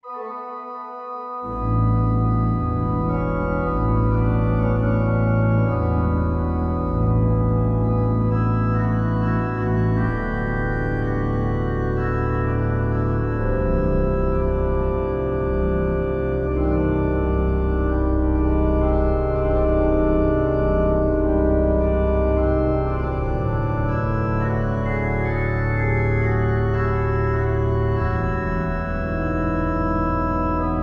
Cornet; organteq1

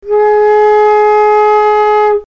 flute

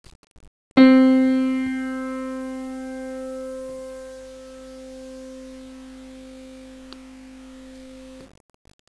piano do4

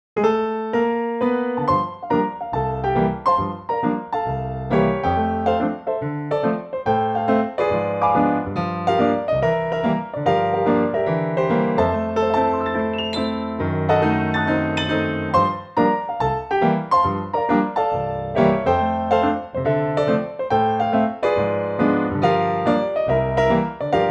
grotrian; pianoteq7

